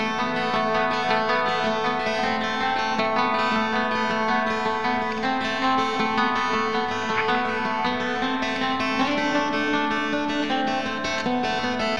guitar, Loops, Sad

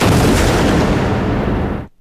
gun shot; Crash Bang Boom

